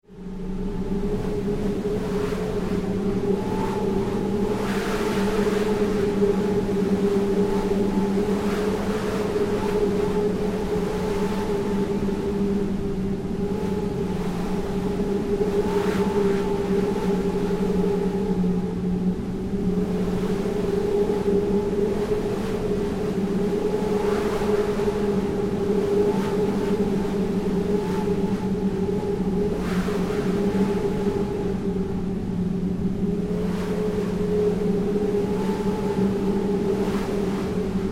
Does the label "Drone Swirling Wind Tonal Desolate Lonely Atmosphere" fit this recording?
Yes